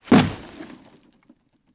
item cardboard drop

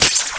throw web; sammyni spyderini

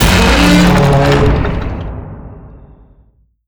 tunnel door open; ambient; Garry's Mod; tf2; rottenburg

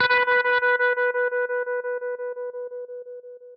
class menu, music; Garry's Mod; tf2

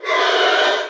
gloves scream; weapons; breadmonster

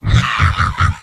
m laugh evil; heavy; tf2; garrysmod; mvm

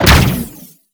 shield hit; skbt astro fleet

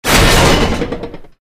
portcullis down; ambient; Garry's Mod; tf2; rottenburg

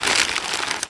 scroll open; Garry's Mod; tf2; passtime

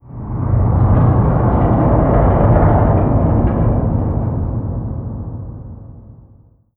spacebase rumble; ambient; Garry's Mod; tf2

